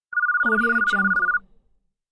telephone ringtone

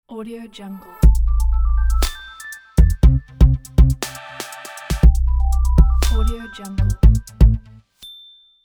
logo; suricateband

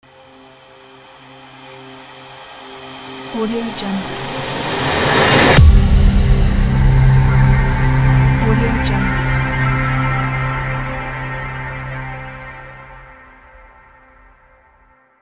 impact logo

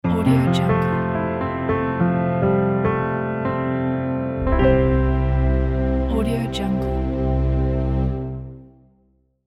piano logo

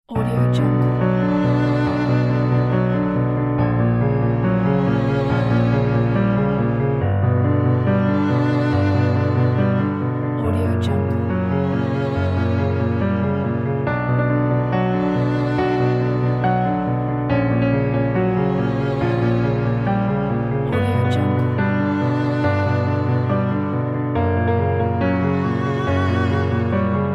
piano and violin

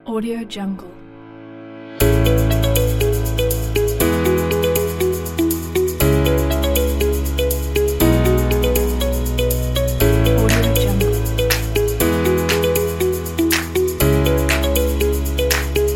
motivational pop music

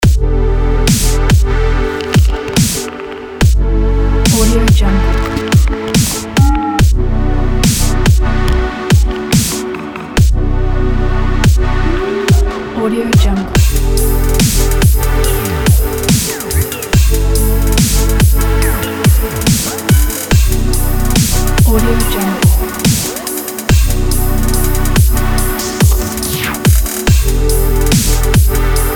documentary wild nature

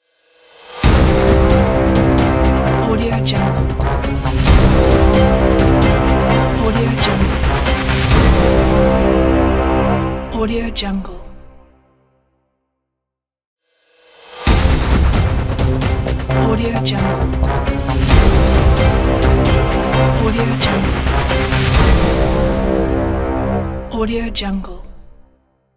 trailer intro logo

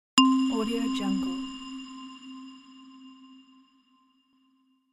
bells sound

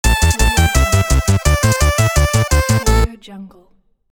retro game logo; friendlysound

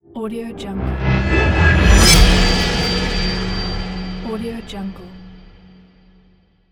east asian cinematic sound fx

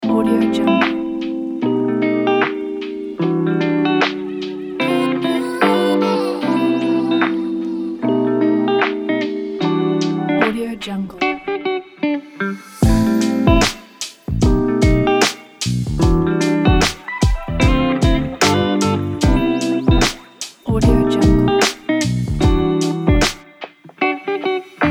rb neo soul guitar beat

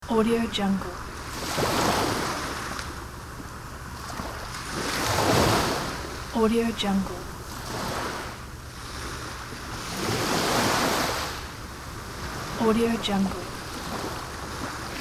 surf

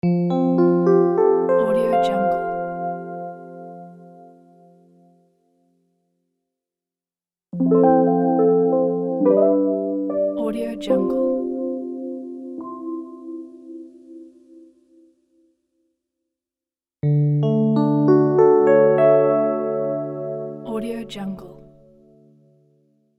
electric piano logo pack

